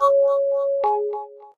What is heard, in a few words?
click; クリック01 クリック。